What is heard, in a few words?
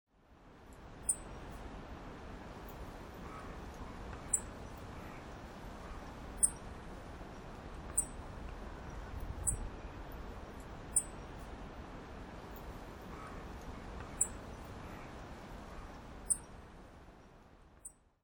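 kimayuhojiro, Yellow-browed Bunting; koe; nagasaki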